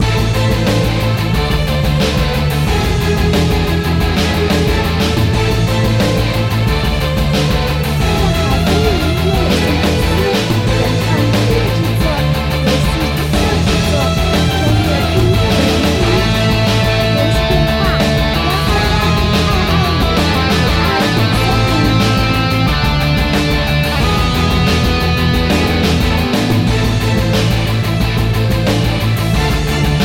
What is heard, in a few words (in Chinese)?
激烈-摇滚-战斗-日系